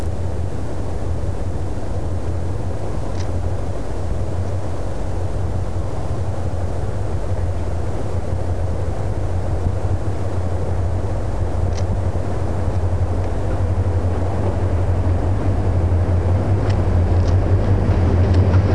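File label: camera and train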